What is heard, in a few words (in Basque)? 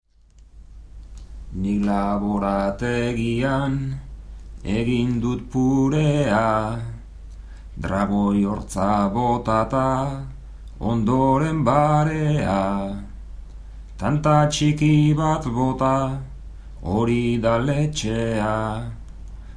bertsos